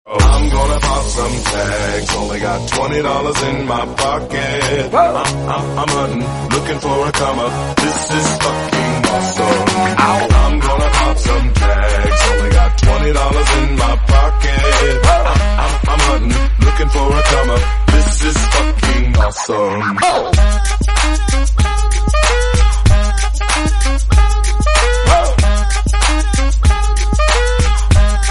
hip hop song tone; nokia ringtones

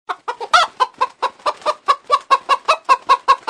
funny chicken ringtone; funny ringtones

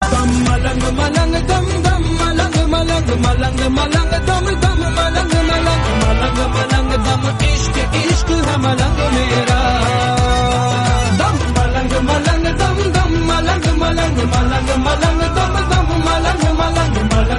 bollywood dance song ringtone

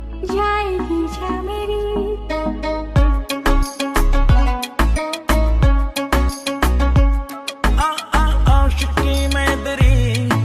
sad ringtone, bollywood ringtone

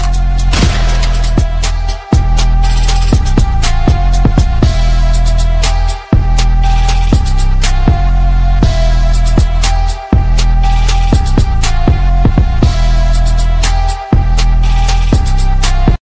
music ringtone, english ringtones

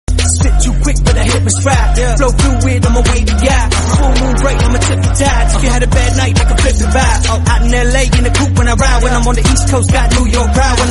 thrilling musical hip hop song ringtone

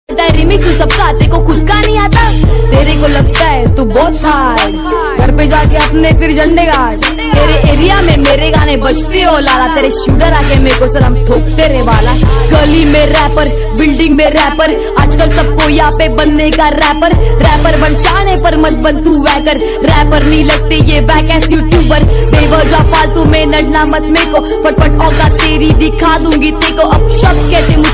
cool rap dj ringtone, english

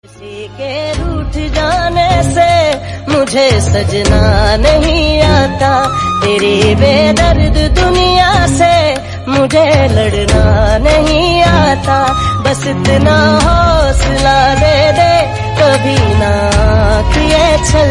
sad ringtone

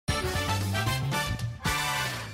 sms ringtones; odyssey

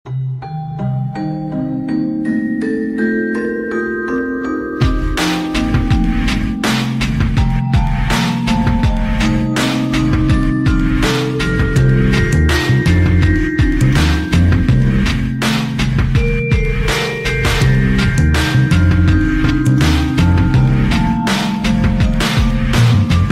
nokia ringtone; sub zero

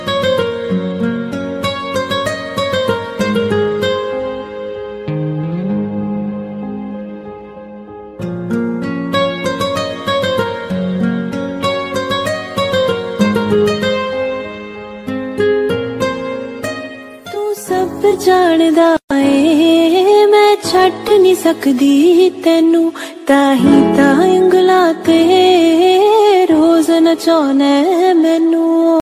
romantic music ringtone

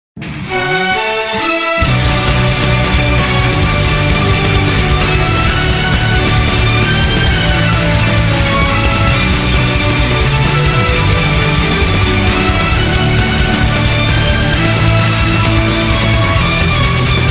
anime ringtone; one punch man

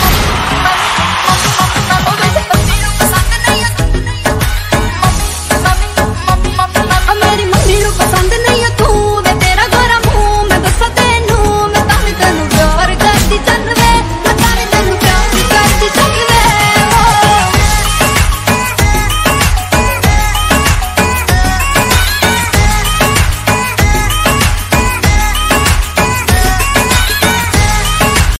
hindi ringtone, bollywood ringtones

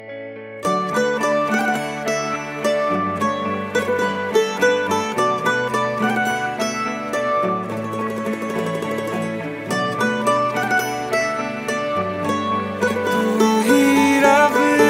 song teaser bollywood ringtone

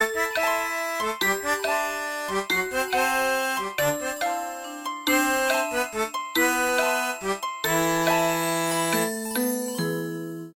ringtone; huawei tinman

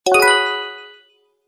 htc notification sounds

